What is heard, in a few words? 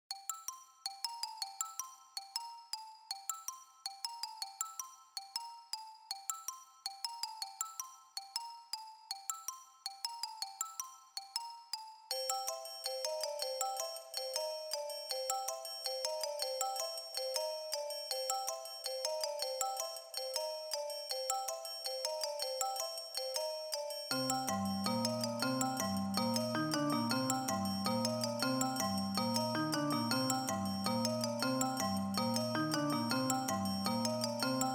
perpetual percussion